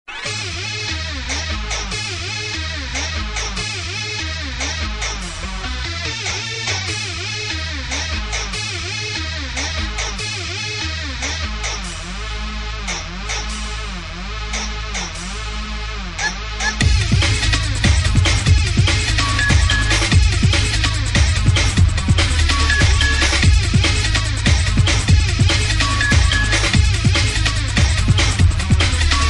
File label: Drum And Bass Version